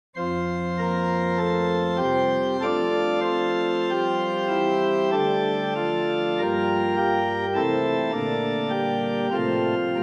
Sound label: Organ Books; hymn